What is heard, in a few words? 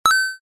Game coin collect